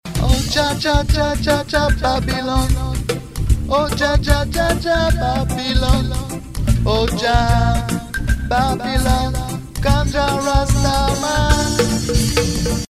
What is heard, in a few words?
original reggae